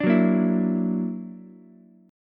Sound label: F sharp Augmented 7th F sharp Ukulele; F#+7 strummed; 7,6,6,7